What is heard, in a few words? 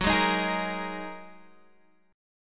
Ab 7th Suspended 2nd Gb